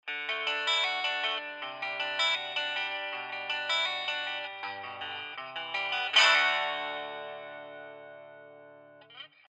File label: brake leslie; T-Racks